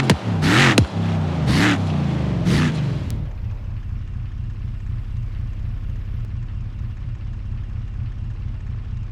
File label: throttle off, vehicles